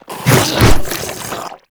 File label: Strike Flesh, Proto Infested Ancient; Warframe; Abilities